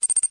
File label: keyboard; mi6